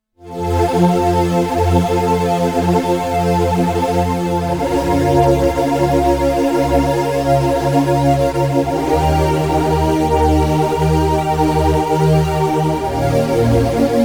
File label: Lo-Fi Choir, korg; dense